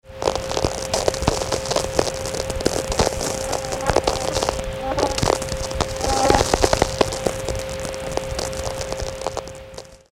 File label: insect